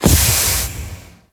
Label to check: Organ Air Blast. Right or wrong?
Right